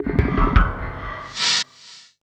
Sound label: 80 LP, Industrial Loops; RISK